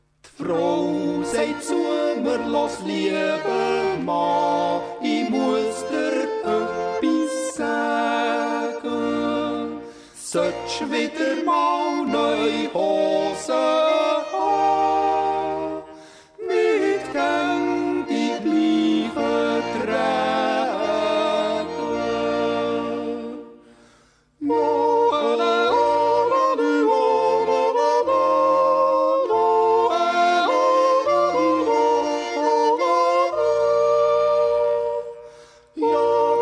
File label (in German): Duett